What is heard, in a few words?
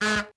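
CART horn; XBOX; Crash Bandicoot The Wrath Of Cortex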